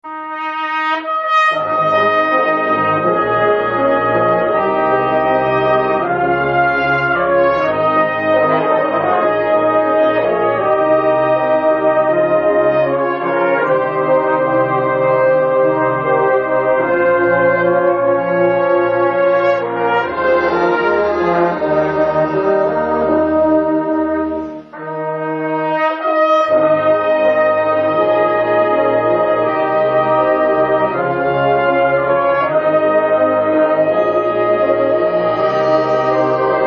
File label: Brass Act Concert